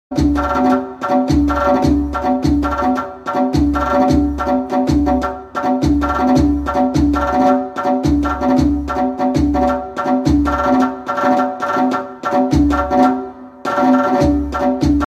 Percussion

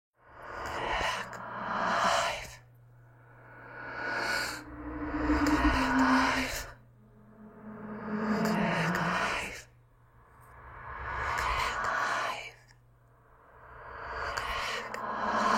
escape room

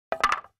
Pouring firewood

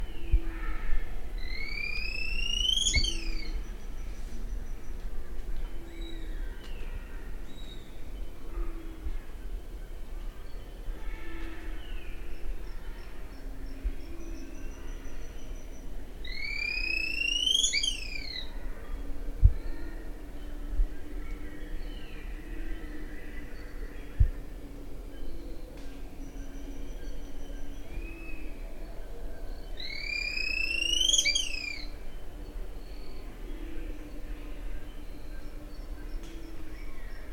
White crested spadebill; پرنده بیلچه نوک کاکل سفید

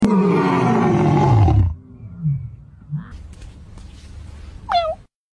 Lion and Cat; چالش شیر و گربه اینستاگرام

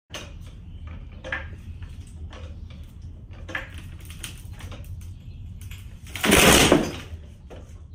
car jack; بالا پایین رفتن; جک ماشین

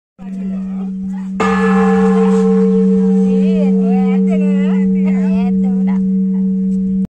big bell

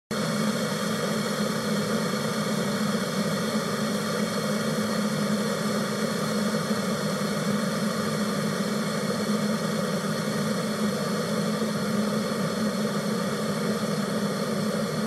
Electric Teapot; چای ساز برقی

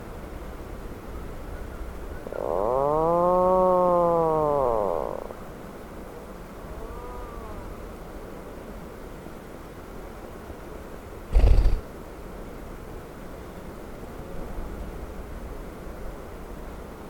Atlantic puffin

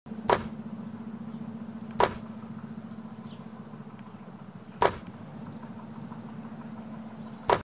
Basketball hoop

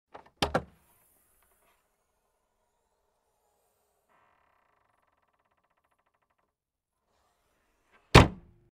Car trunk; باز شدن; صندوق عقب ماشین

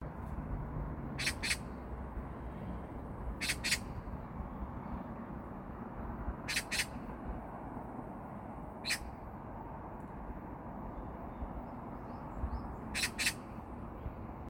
Oriental magpie

